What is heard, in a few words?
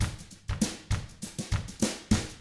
FUNKY, Drums